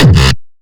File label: bass kick